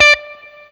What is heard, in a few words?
BLU POP, Guitar & Bass